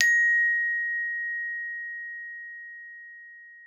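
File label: glockenspiel A#4; Xsample VOL-4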